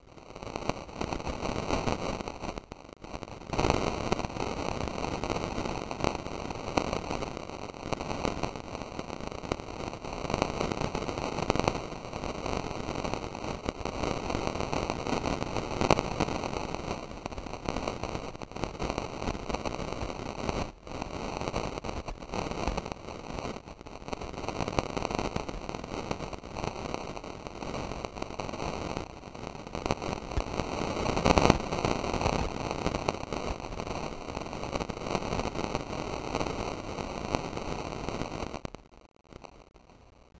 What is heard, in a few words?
Static Radio Interference; bottlerocket